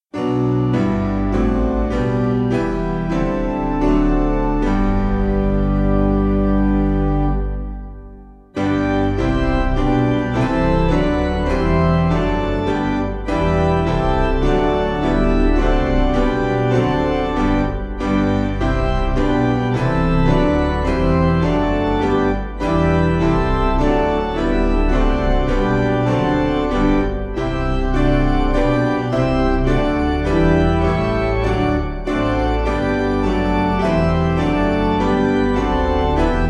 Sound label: piano, hymn